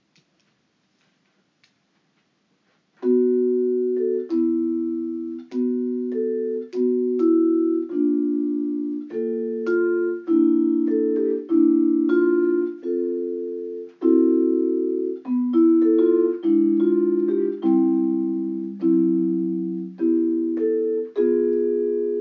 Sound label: comp, vibes